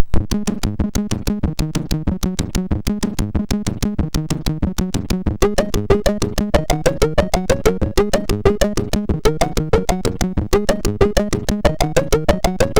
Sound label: sid MOS6581R4